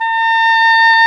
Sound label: OBOE; Roland - LA Composer vol. 1